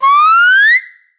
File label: rise